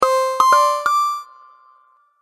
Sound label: Idea Interface Sound, hit; sound design